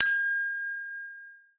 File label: Chess, notifications; MagicOS 7.1